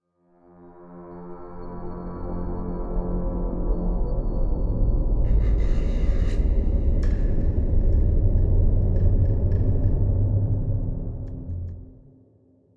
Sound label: Stinger